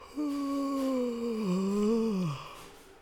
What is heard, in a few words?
Yawning